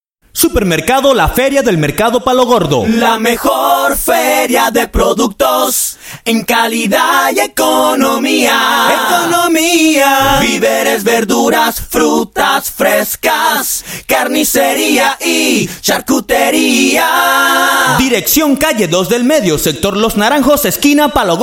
voces; la feria del mercado palo gordo

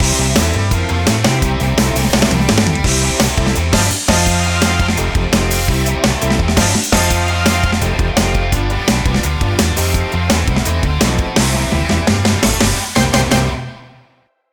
Jingle musica

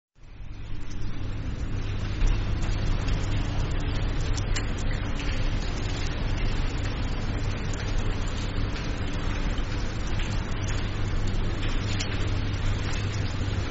Tristeza Abandono Espera Humedo

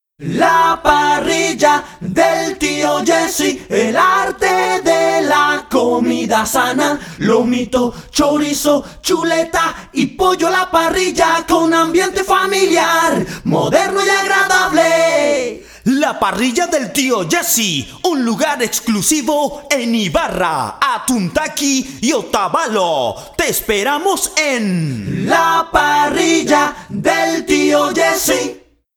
Jingle VOCES; Tio Jessy; Jingle Arte

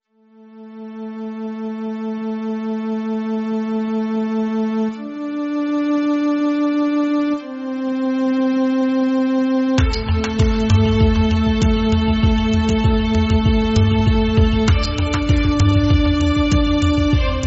Neutral Denso Etnico Transitorio

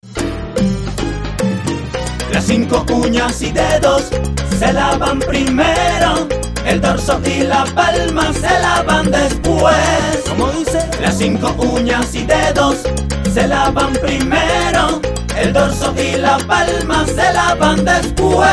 Jingle Lavarse Las Manos

